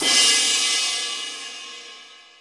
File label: Cym Slo Crash, Drum Machines Alesis D4